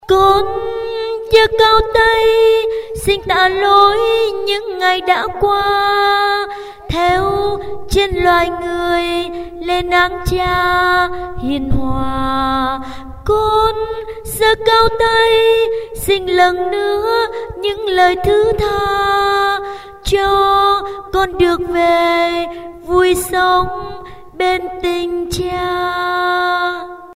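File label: soprano alto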